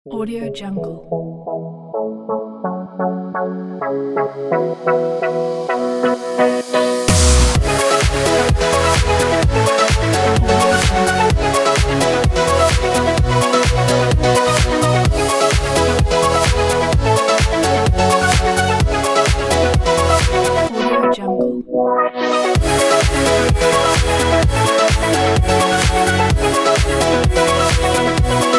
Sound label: edm